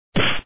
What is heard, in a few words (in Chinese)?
摔碰; Samurai vs Ninjas